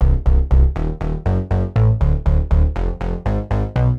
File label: Tense Bass 120bpm C; horrorscape